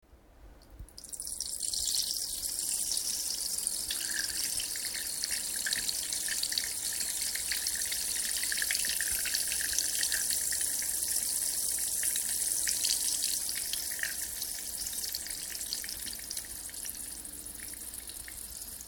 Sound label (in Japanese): しょしょしょーおしっこ